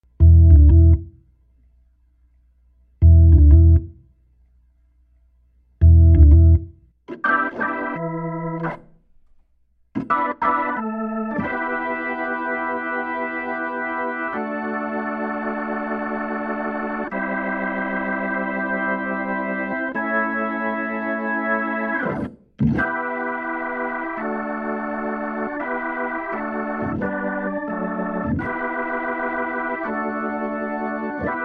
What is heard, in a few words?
Recording Hammond B3; Apogee Quartet; KM